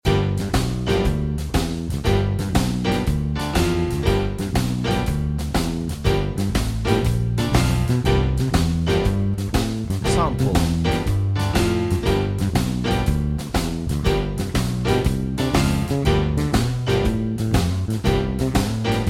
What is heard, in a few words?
piano drums rythm